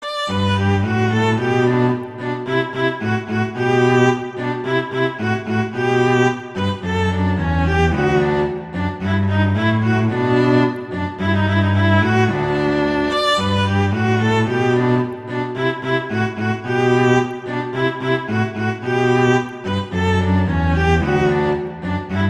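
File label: Duet, violin, viola; Vla Vc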